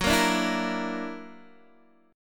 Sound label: Gb Augmented 7th Gb down Guitar DADGAD